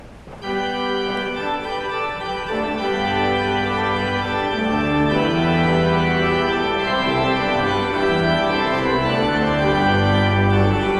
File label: Postlude